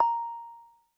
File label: Popup Appeared; ESAN VO Sound Schemes; ESAN Business SSound Pack